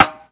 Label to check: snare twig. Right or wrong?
Right